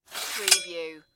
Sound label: Sword Sheath Remove